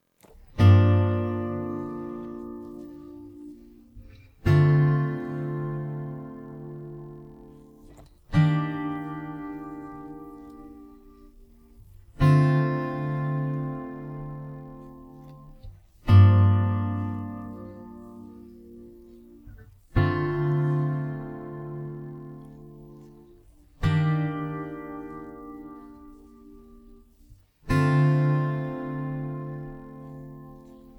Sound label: Acoustic Version